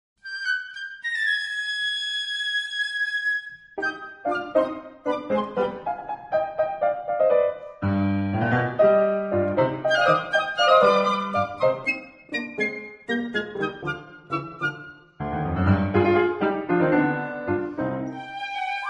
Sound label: tango, piccolo; vinicio meza